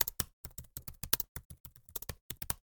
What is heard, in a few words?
keyboard high speed